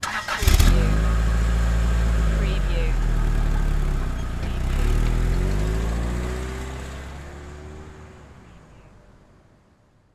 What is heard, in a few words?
Auto Start Drive Off; sound effects bible